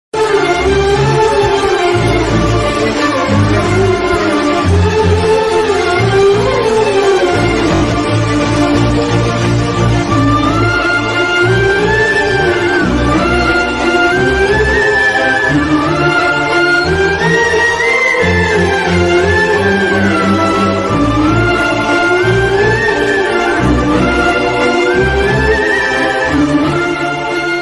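love background music ringtone violin background music; Arya movie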